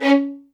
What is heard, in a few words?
C#4, LOUD VIOLINS; Q Up Arts; Denny Jaeger Master Violin Library